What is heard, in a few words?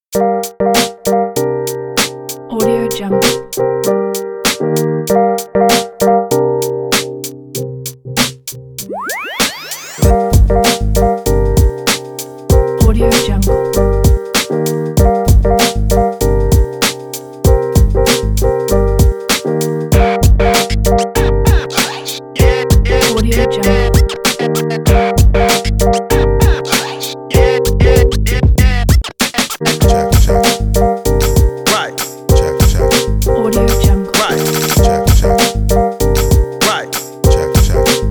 lofi hip hop; موسیقی بی کلام; هیپ هاپ